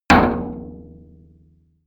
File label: impact soft sheet metal damped; maschinen; soundpool